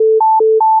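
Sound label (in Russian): звук когда идёт соединение с оператором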